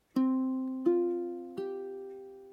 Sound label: chord c f g, ukulele